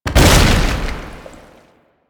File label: Tank Infested Melee Impact; Enemies; Abilities; Warframe; Lasrian